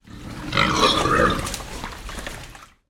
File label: pig grunt inside barn wet sloppy mud